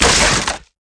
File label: wood